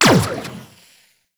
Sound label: Shot Fire, Weapons; Akarius Prime